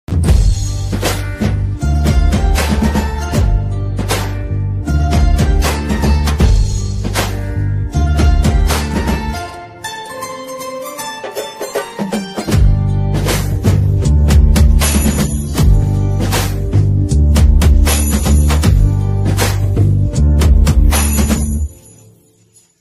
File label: song bgm